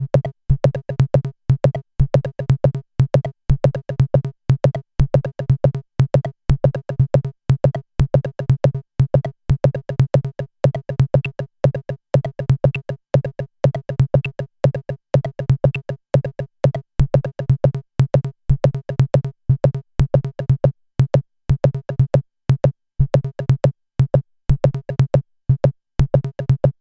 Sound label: randomRhythm Dice Probabilities